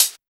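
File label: GARAGE CH, closed hat; Drums In Your FACE!; BONUS HATS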